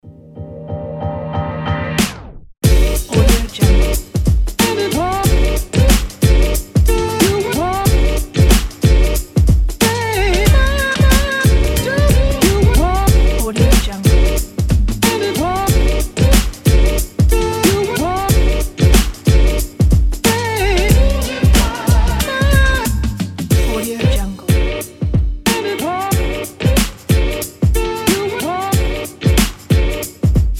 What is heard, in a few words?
hiphop